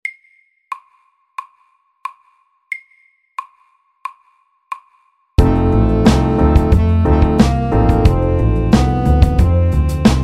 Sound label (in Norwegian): Kromatisk blues MIDDELS